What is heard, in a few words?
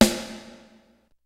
kicks snare, kid; Kid Nepro; Elite; KICKS SNARES